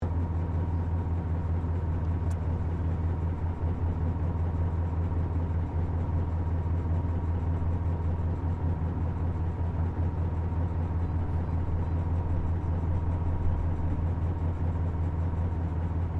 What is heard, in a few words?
TANK M4 SHERMAN IDLE, American M4 Sherman Tank; Trucks; Vehicles